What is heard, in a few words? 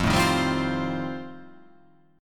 F 9th F, chord